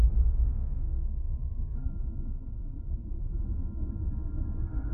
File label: riser sweep